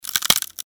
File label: bone breaks